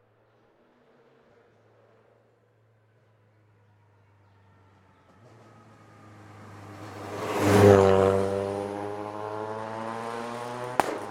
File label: Citroen Xsara WRC - EXT - FAST - Various; Pole Position; Citroen Xsara WRC 2001; MS Decoded